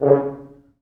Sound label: 4 FrHorns; C#2; East West; Quantum Leap Horns Sax; 4 FrHorns MOD